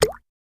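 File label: Drip Single Falls Liquid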